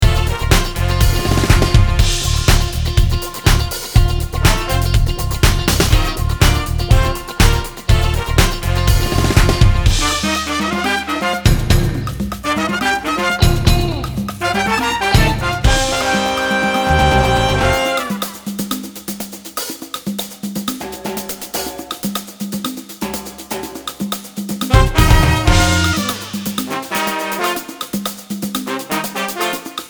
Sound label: F o Piano o Backings